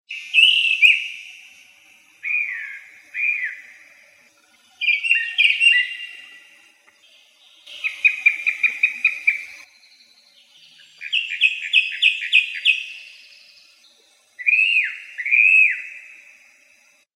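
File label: song thrush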